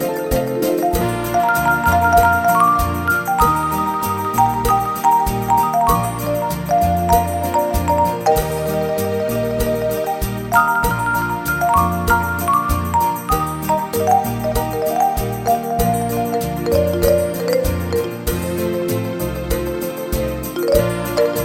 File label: Bolero Ranchero